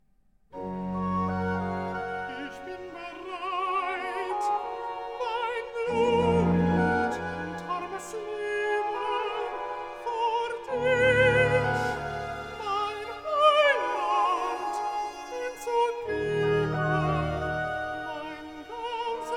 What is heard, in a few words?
Recitativo Alto; Bach Johann Sebastian; Sie Werden Euch In Den Bann Tun, BWV 183; Kantate Exaudi; Bach Edition Brilliant Classics